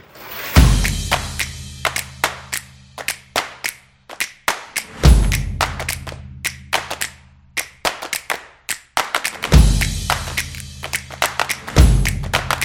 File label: This Is Percussion Action; soccer stadium